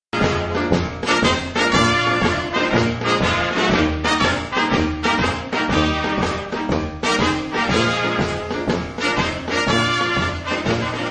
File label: grand march